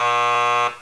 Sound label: siren